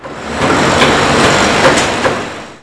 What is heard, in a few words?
buildup; svencoop; cnc